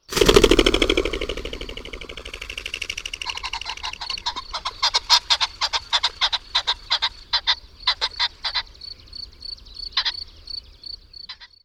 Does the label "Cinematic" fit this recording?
No